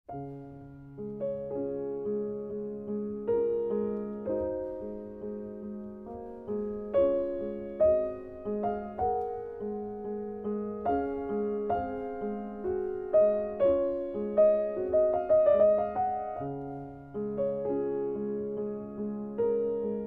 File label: Prelude In D-Flat Major; Chopin; Op. 28, No. 15, 'Raindrop'